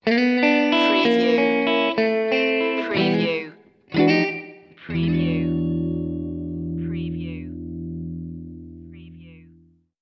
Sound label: sad guitar sting